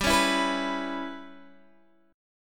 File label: G 6th Flat 5th, Guitar, Open G